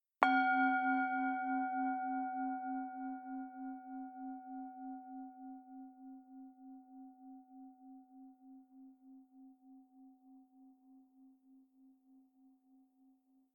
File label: bells only